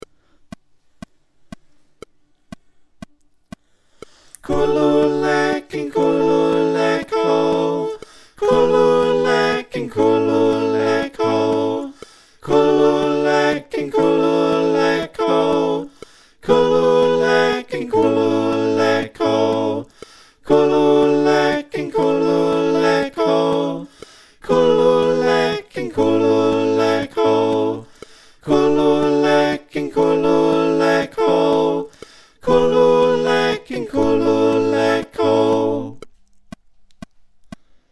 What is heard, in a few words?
Backing Chant SATB